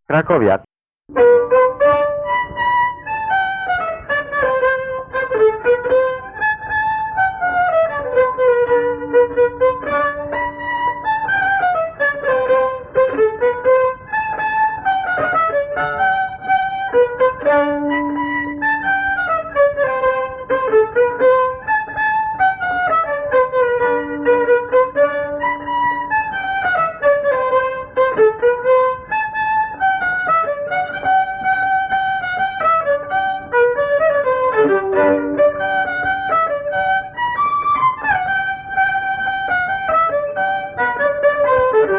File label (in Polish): skrzypce, Krakowiak